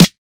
00s verby snare drum sound f key, snare sample tuned to the F note; snare drum samples